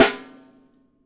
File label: SN, KICK & SNARE; AKAI S6000 CD-ROM - Volume 1; DRUM RYTHM